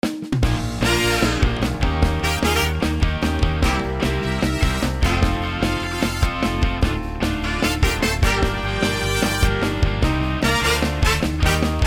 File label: Horns; Fast; Chris Hein Horns; BEST SERVICE